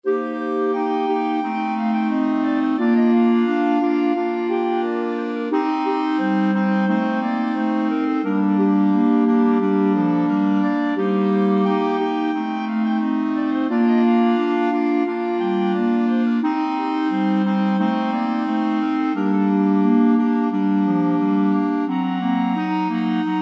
clarinet choir; borodin

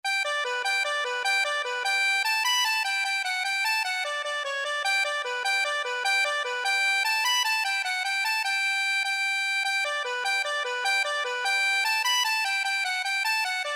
kelly the rake, accordion

